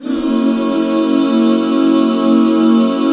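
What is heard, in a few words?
HAL F#7 right, Back Chords; Sound & Vision - Gigapack II CD 1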